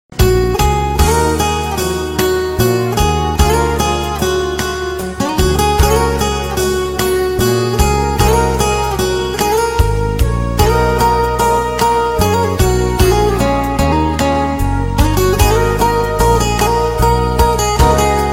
romantic guitar tone

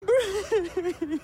Sad Zombie

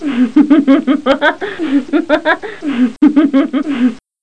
Giggle

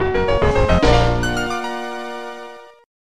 Stage Clear

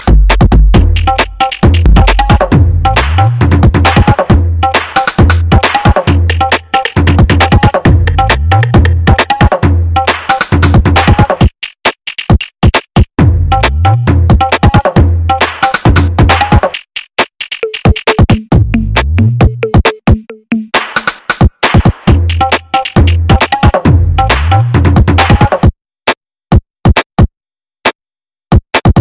Desi Beats

